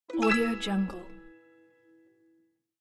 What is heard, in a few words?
notification